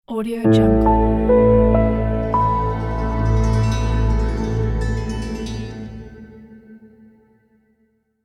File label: classic mystic logo